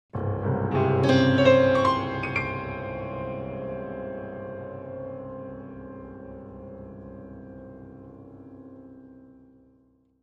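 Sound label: Grand Piano Dramatic; keyboards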